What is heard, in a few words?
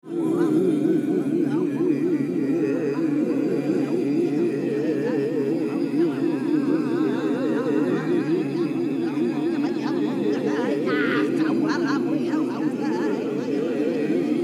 vocalisations